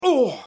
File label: grunt